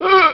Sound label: zombie pain, male